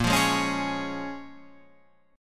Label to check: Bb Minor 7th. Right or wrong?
Right